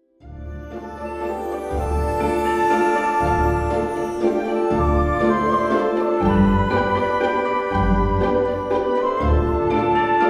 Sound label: Menu Music; Run-and-capture; Sound Pack 01.09